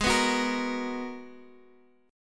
G sharp Minor 9th, Guitar Half Step Down

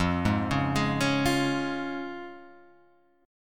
F Minor Sharp 5th, F, Guitar, Half Step Down

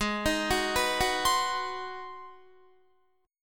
Ab 7th Sharp 9th down Guitar; Ab7#9 arpeggiated; JGuitar

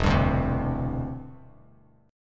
E Minor 7th Sharp 5th, down, Bass Standard; strummed

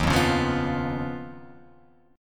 D sharp 7th Suspended 2nd Sharp 5th Guitar Open F; strummed; JGuitar

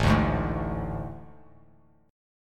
A Major 7th Suspended 4th, Bass Standard; AM7sus4; strummed; JGuitar

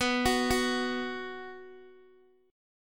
B 5th B Guitar, chord; arpeggiated; 18,21,18,x,x,x; JGuitar

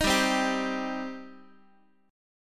G Suspended 4th Sharp 5th, Guitar; Gsus4#5 strummed; JGuitar

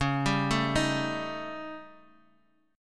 A 7th Flat 5th C sharp

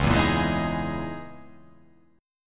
C sharp Minor 6th Add 9th C sharp

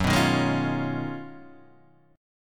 G sharp Minor 13th F sharp, guitar chord

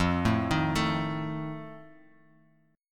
D sharp Suspended 2nd Flat 5th F

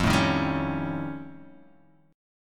F Augmented 9th F Guitar Open G

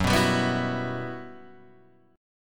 G Major 13th

